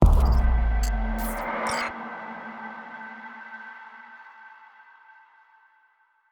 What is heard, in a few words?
Quest Completed; Custom UI Sounds; Cyberpunk 2077